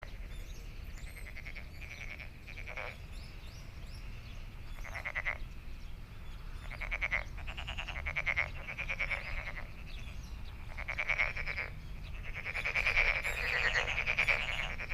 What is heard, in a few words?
frog